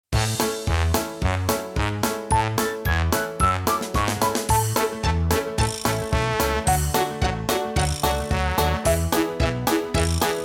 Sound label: Instrumental